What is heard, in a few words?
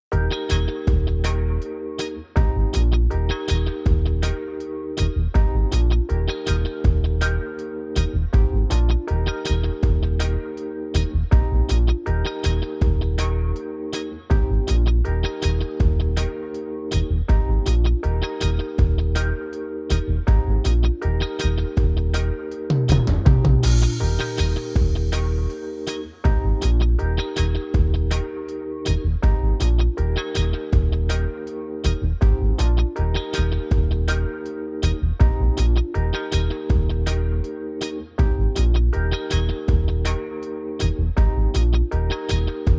skank